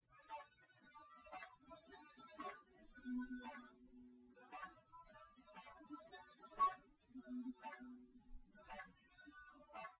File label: test nodrums liverec 250ms 5cm src; SiSEC08; SiSEC underdetermined